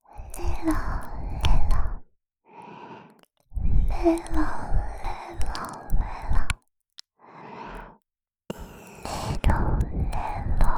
れろれろ…（発音）, ASMR, 左耳

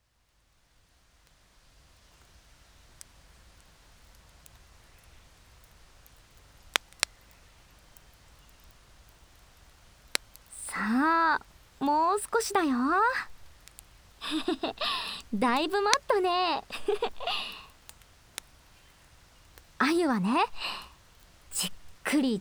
咀嚼音・焚き火ASMR; 鮎を食べちゃおう♪

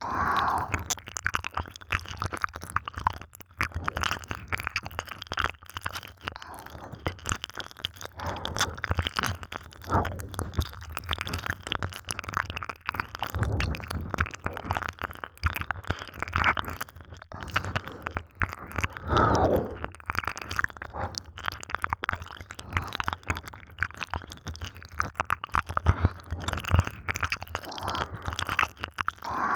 耳舐め

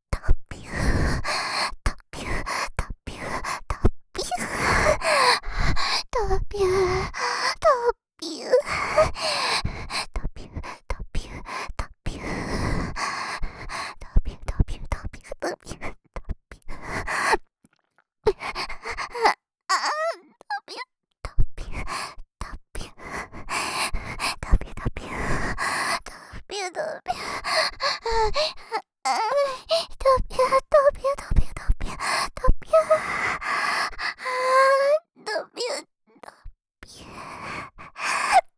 どぴゅどぴゅ, ASMR

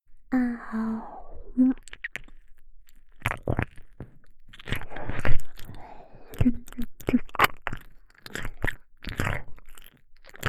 耳舐めループ, ASMR; 妹HELL; 難易度HELL

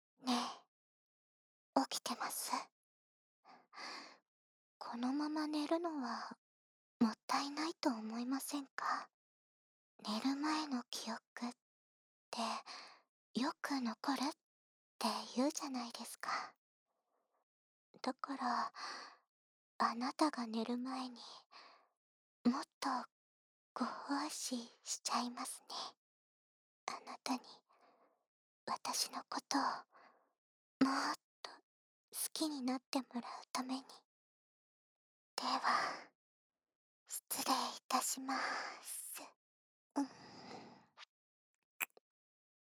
耳 を 舐められる (右耳), ASMR; そのまま寝ようと思っていたら

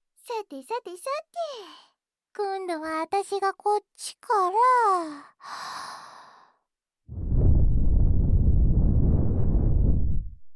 お耳のリフレ～スライム・耳舐め, ASMR

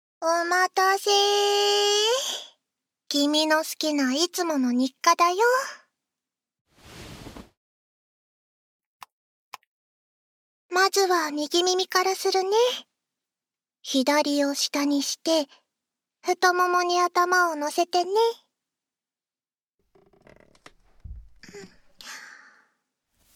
ささやき耳かき; ASMR; SEあり

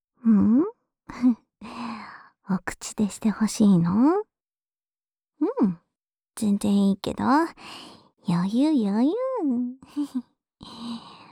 フェラ

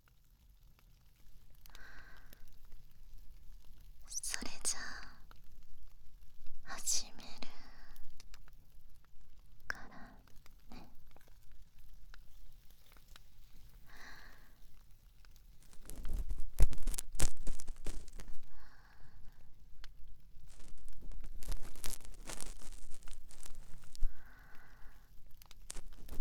暖炉のある旅館で耳かき, ASMR

